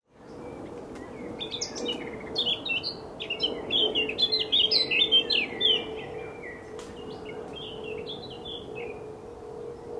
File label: Blackcap and Blackbird, Stave Hill; Richard Beard; london